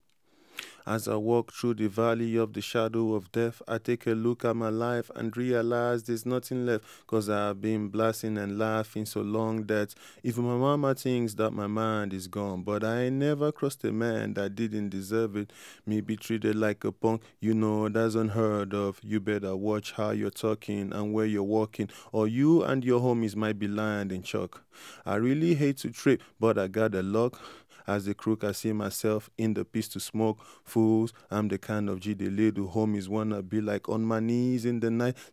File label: Vocal